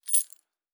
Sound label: Objects Small; Survival Game Sounds - Lite Edition